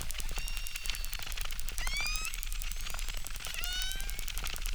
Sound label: Chirp, acquarium